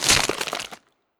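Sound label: Ice Pop Break; Ice Sta Pop Breack2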